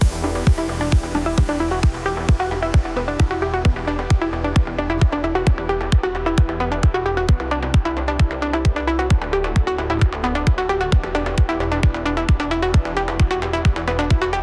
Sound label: TechnoBIT